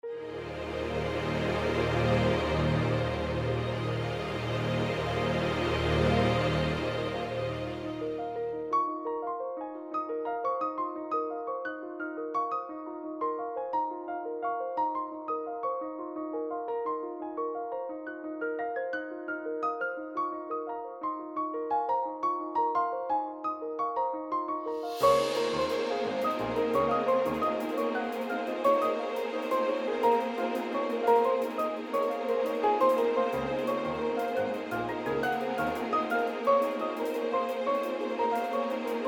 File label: Menu Ambient; Battle Arena; Polish